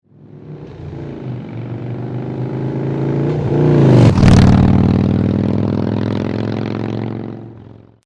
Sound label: FLYBY